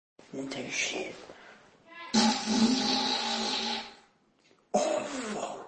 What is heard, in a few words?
toilet big fart